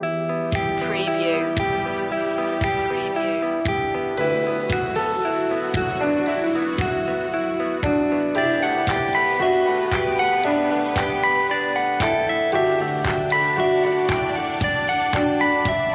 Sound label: a positive world loop